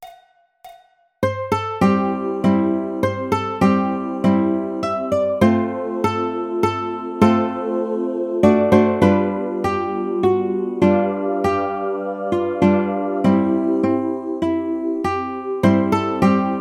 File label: Solo Ukulele